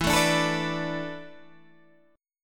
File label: E 7th Suspended 4th, Guitar, DADGAD